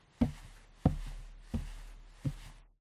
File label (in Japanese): 足音(屋内); 足音部(屋内)